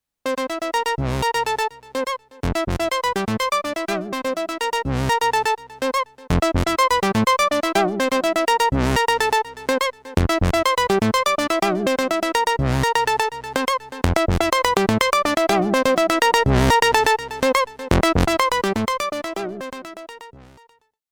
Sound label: Compressor